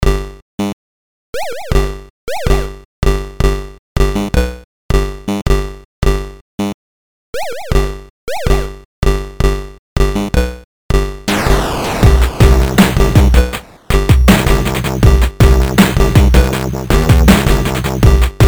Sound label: Box with basses